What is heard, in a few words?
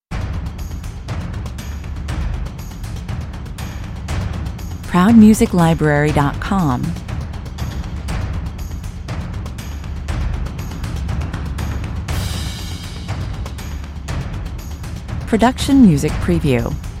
percussion mix